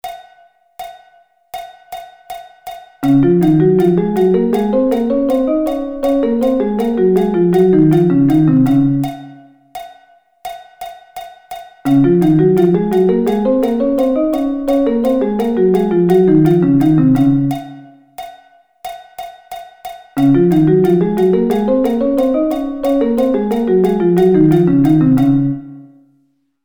Db Thirds

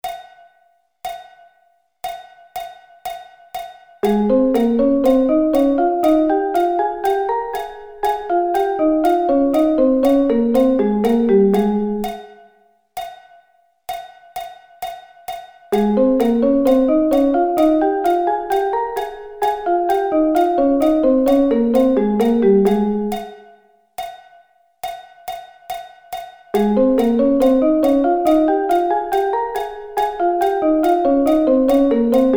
Ab Thirds

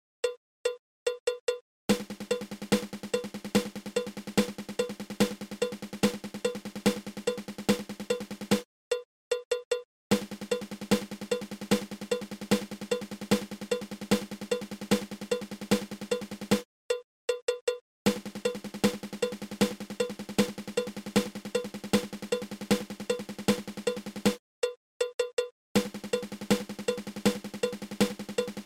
Triple Paradiddle

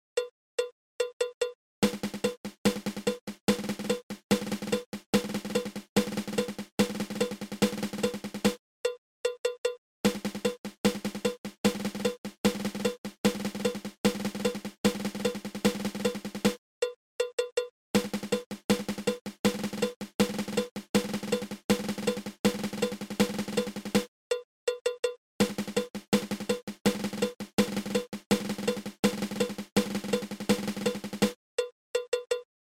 Drag Paradiddle

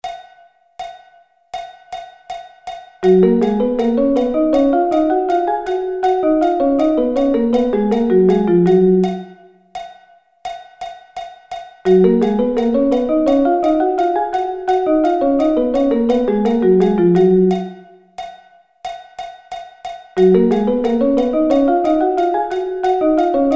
Thirds

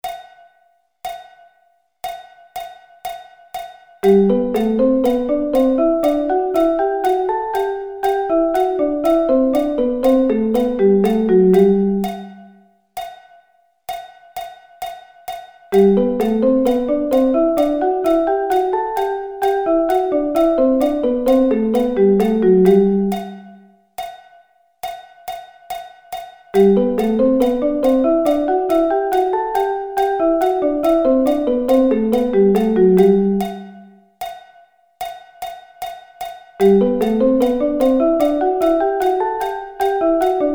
Gb Thirds

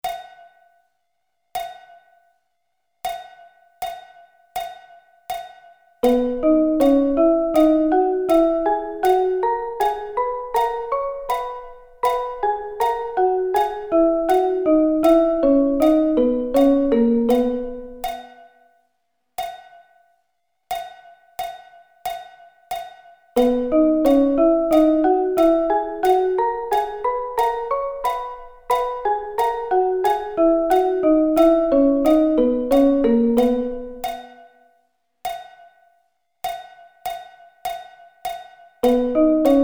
B Thirds

